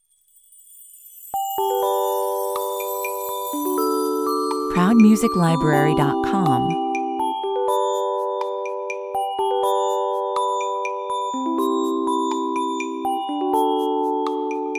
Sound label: carillon 62 bpm